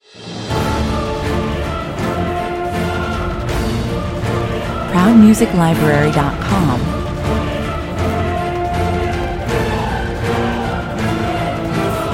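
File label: with choir less percussion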